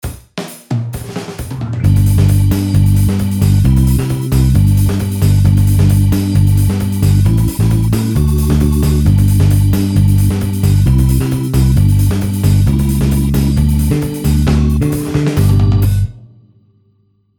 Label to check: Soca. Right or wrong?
Right